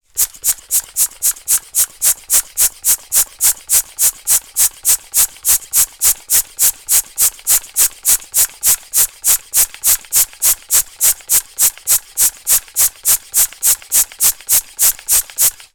transport bicycle hand pump operating fast speed valve end